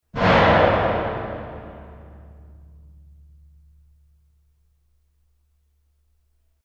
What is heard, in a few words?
heavy metal hit